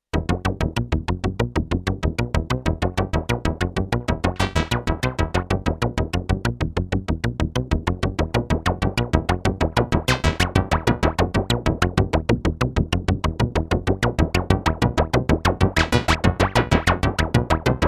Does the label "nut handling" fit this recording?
No